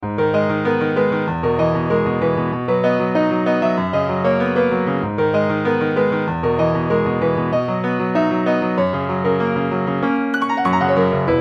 piano

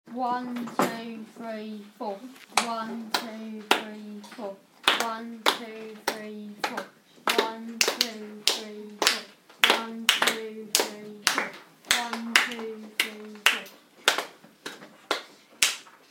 polished rhythms

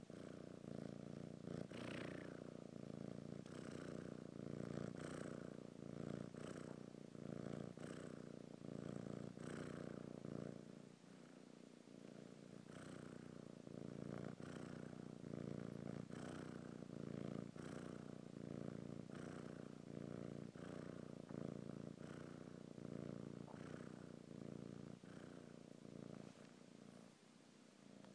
my kitten purring; willow

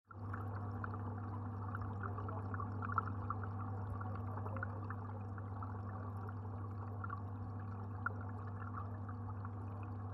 burbling water sounds

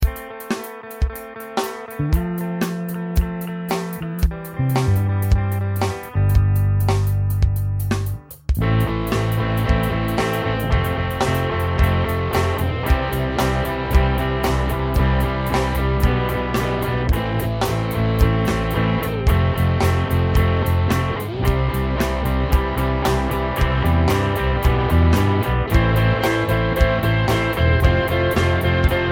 4/4 rock

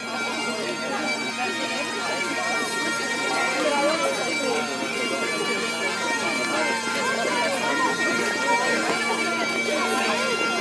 Wedding walk through Fowey with bagpipes

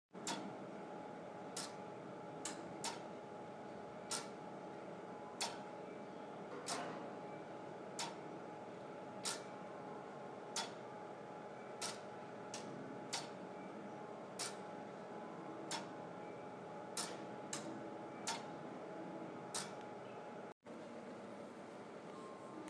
clock sound

